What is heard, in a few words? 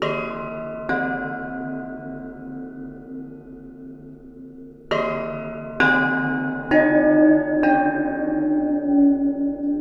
Waterphone Hard Mallet; Sonic Foundry (Sony Creative Software) - Ambient Atmospheres & Rhythms; Ambient-Metals